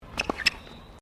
TENNIS SHOES SQUEAK, Local tennis club; Sports

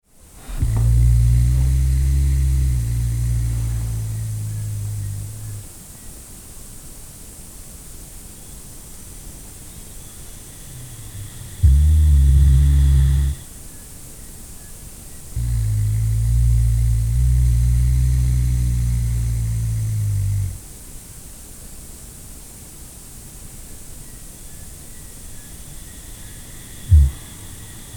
Snoring Breathing very quietly

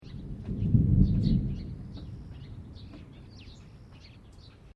THUNDER DISTANT LOW BIRDS

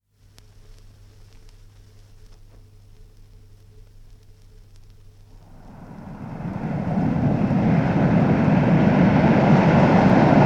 Heavy sea breaking on shore (From 78 disc); Ocean; Nature; BBC

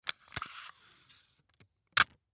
Scotch Tape Pull Out Piece Rip Off; Office; Blastwave

